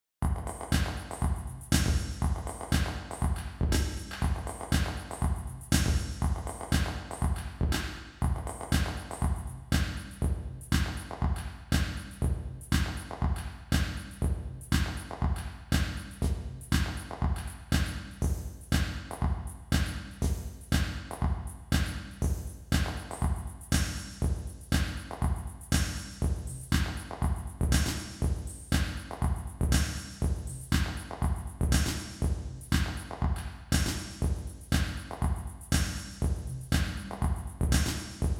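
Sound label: Percussive Room Boom; Redline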